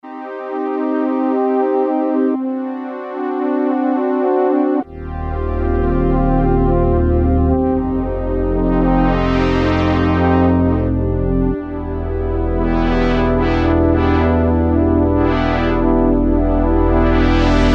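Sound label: Analog Strings, Roland W-30; Factory Performance Patches; AnalogStrngs; Factory B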